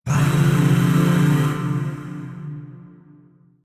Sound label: Shawzin Maj Chord D; Warframe; Zariman; Void Shawzin; Chords